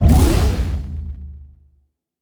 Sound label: Sci Fi Explosion